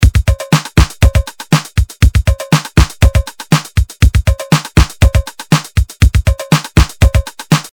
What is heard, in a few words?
tr 7 sounds